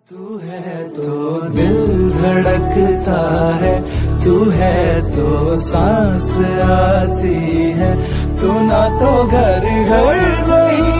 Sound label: Male